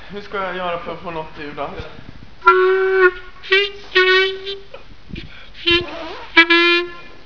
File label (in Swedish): klarinett